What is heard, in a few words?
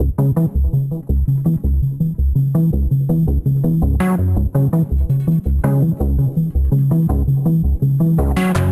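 Chasing Bass, C# minor 110 bpm; MIDI Clips; Tonal; Motifs; Ableton; Factory Packs; Mood Reel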